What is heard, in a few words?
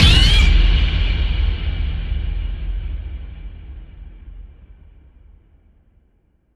Boom